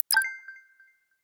success

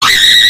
scream; korone; vtuber

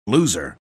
Loser Sound Effect

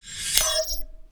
zoom Out; staratlas

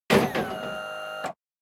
elevator; idle mining empire

